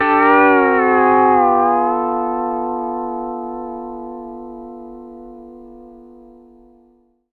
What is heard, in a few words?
PEDSTL CHORD G9, Country